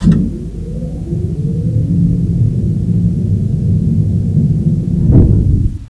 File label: Boeing 747-400 PW gear; X-System 4.65; planes; Heavy Metal